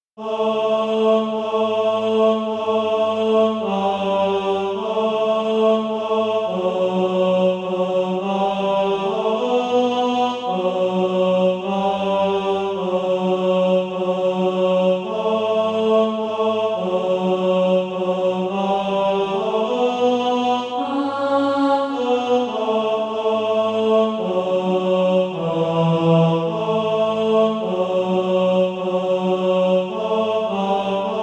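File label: tenor, SATB Practise Music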